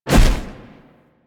Dash Hit; Warframe; Focus; Operators; Powers

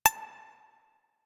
Health Pulse; DE; Warframe; Tenno Shield; Shield Down

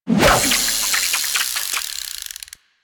Fishing Spear Line Retract; Warframe; Weapons; Eidolon Fishing Spears

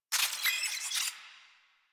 Space Drone Pain; Warframe; Corpus Space Drone

